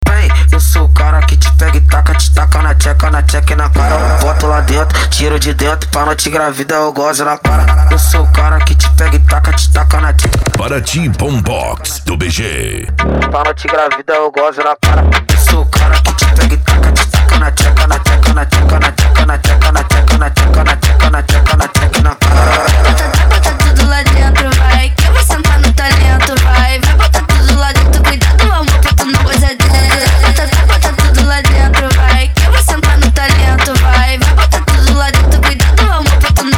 bombox funk

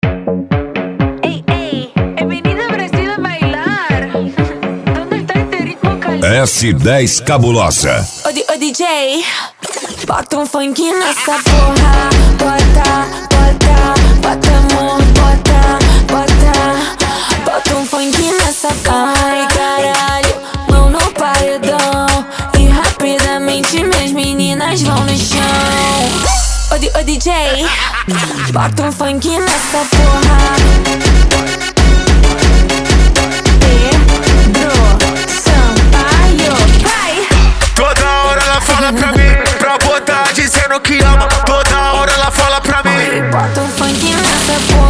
funk comercial

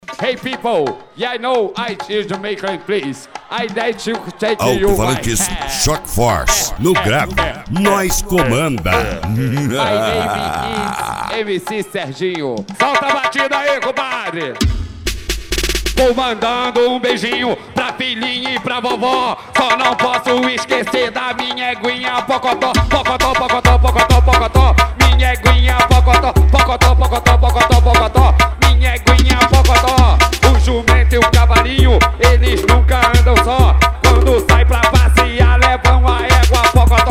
sound car; reboque poseidon sartori

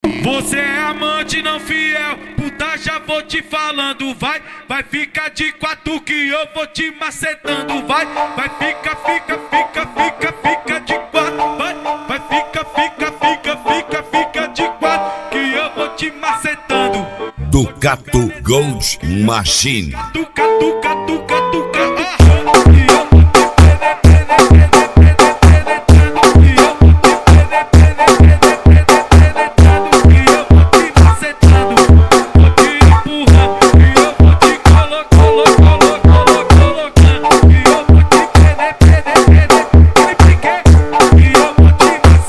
especial funk

